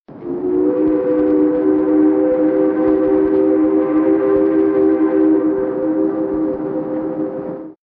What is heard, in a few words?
boat siren